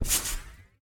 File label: Trampoline Bounce